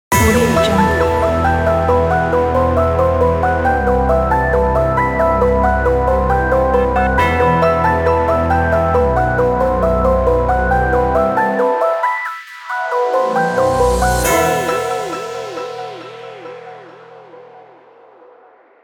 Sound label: dark modern hiphop logo; vsinebeatz